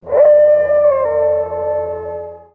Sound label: mega AWOO; p4u; Koromaru